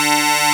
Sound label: DIGI BASS; AKAI S6000 CD-ROM - Volume 1; SAMPLE WAVE MIXING 1; 128 PRO SYN